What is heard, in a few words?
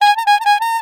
Toybox Bonus Sound; Blastmorph Pinball; xbox series xs